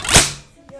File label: goofy ruler sound